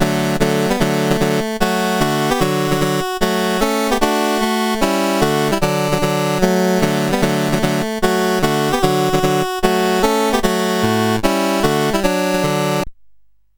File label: menus, sid CSG8580R5; Games Summer Edition